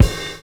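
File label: RAPID FILL; Univers Sons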